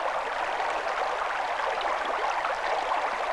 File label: hose; Mr. Wonder's Greatest Toyshop on Earth; OmniMedia